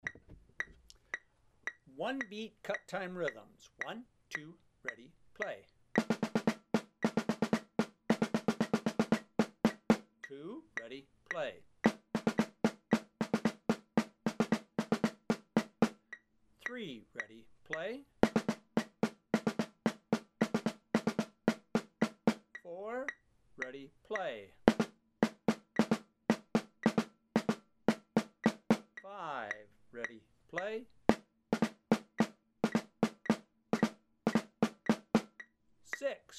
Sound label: one beat cut time rhythms